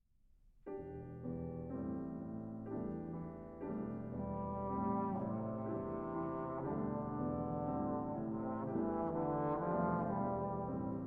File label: Trio I moderato